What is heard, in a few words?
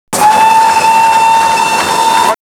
whistle, castle class